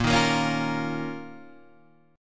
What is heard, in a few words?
D sharp 7th Suspended 2nd A sharp